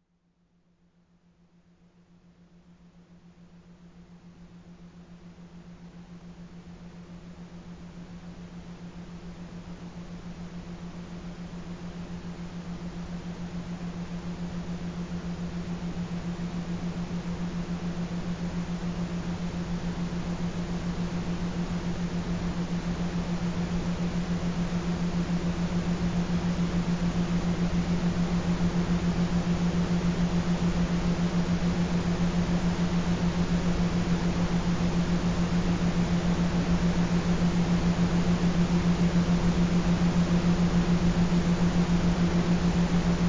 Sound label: lucid dream theta soundscape